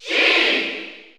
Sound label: Sheik Cheer German